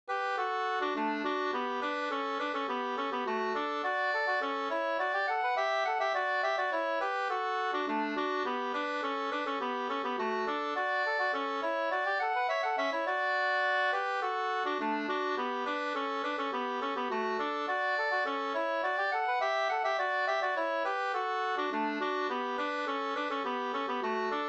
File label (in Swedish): polska, 2st; Einar Viklund Stode Mp